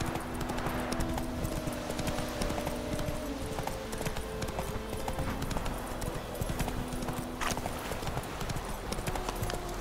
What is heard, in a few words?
ambience nighty; The Witcher 3